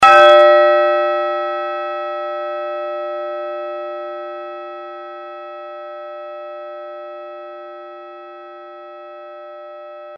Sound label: BIG BELL